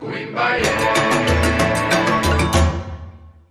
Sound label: Hit